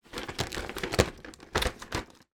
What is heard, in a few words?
card chocolate filled advent calender movement; foley